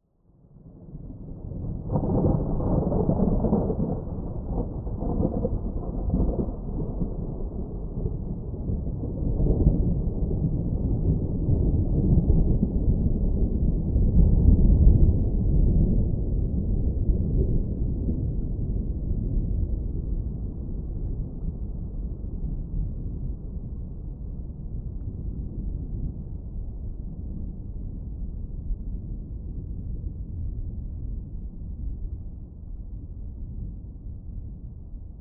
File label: Thunder long slow peel; boom library; Thunder And Rain